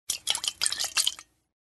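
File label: sounds of aerosol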